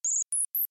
High Pitch Beeps

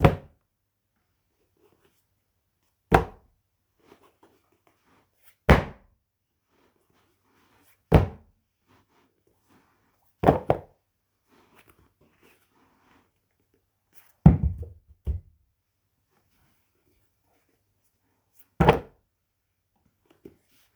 Book Drop Carpet

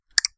Click

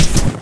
Shoving A Fool Over

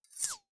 Swoosh Knife High Pitch

